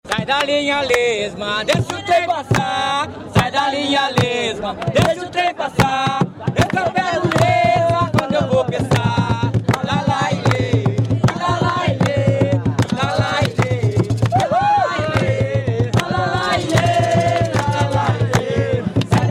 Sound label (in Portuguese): jongo de guaratingueta, jongo; sai da linha